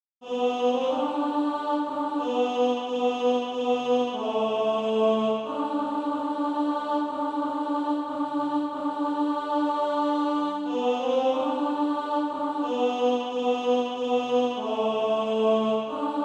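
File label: tenor, SATB Practise Music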